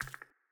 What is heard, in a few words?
wrong